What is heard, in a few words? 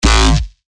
Bass Shots A; Laxative; revealed bass shots vol 2